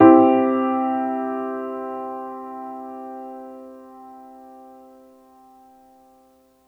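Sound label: Piano Upright major soft; TrashLibrary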